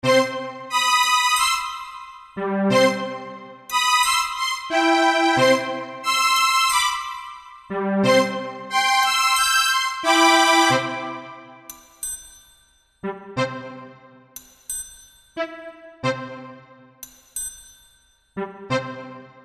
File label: orchestra goofy